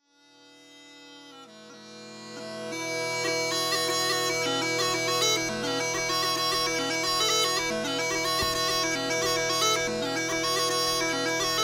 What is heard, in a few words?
smallpipes cane reed; Laburnum combination smallpipes with A chanter,drones tuned to B-e.